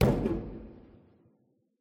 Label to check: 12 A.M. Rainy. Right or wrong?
Wrong